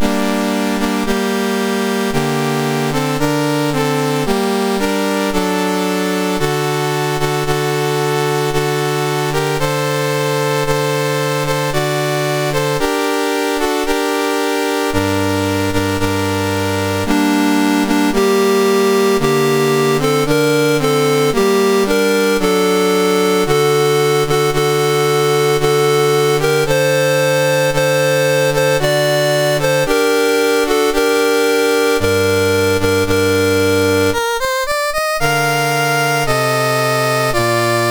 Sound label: Yemen, National Anthems, MOS 6581; MOS6581R3